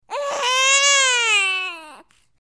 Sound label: Baby Whines Cries Bre